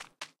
foot; attackonball

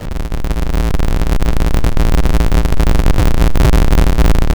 rumble; minorminer

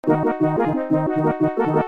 battle Music; arpg

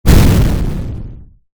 explode; Asteroid-js

